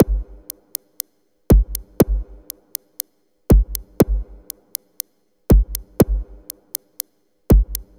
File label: Minimalism, Processed Percussion